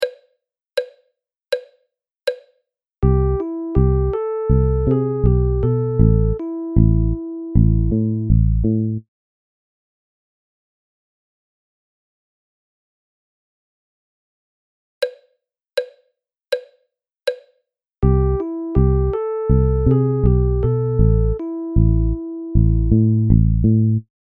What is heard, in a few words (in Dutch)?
melodisch dictee